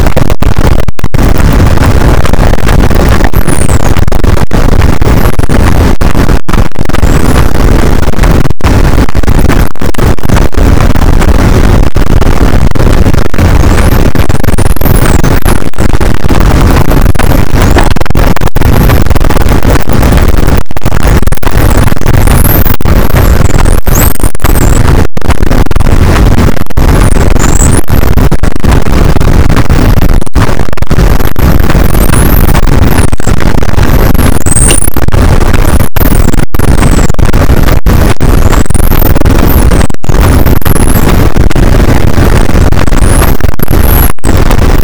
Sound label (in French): cachalot positif, boat; Physeter Macrocephalus